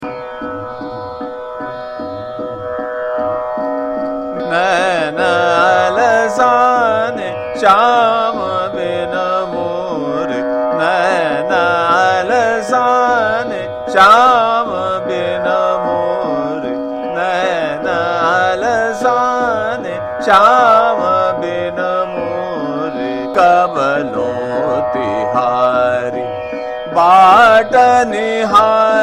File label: Chota Khyal - Trital, Madhukauns; Nain Alsane Shyam Bin